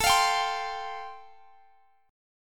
A Minor 7th Sharp 5th A, Guitar, Standard; strummed; x,x,19,22,20,20; capo=2

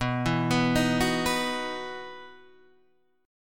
E Major 7th Suspended 2nd

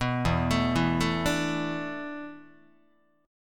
E 7th Suspended 4th, Guitar; E7sus4; arpeggiated; 2,0,0,2,1,1

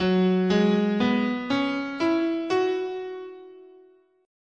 Gb Suspended Pentatonic, scale

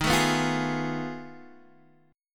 F 7th Sharp 9th Flat 5th D sharp

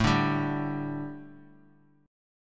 A 7th Suspended 4th A, Bass Standard; strummed

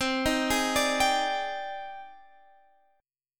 G sharp Major Sharp 11th C, chord

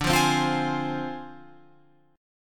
D Minor 7th Flat 5th Guitar Open F

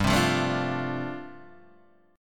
G 9th Suspended 4th, Guitar Open D

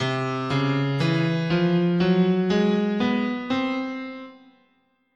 C Persian, scale

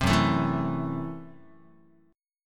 F sharp Minor 7th Flat 5th F sharp Guitar; strummed; JGuitar

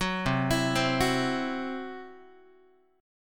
C Major Flat 5th, Guitar; arpeggiated

